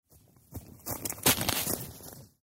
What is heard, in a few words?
movement